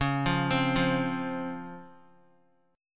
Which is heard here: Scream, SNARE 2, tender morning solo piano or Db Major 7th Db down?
Db Major 7th Db down